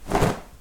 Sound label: shake clothing; 3maze